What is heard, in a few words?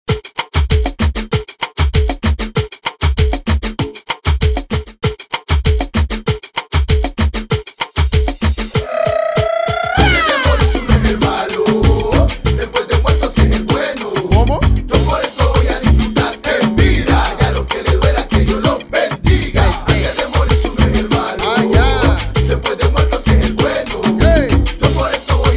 remix bpm 97